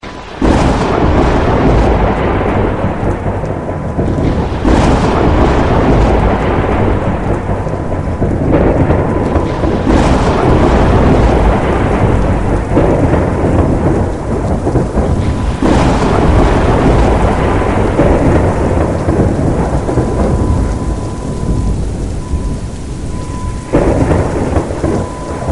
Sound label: Cloud Burst Thunderstorm Theme Ringtone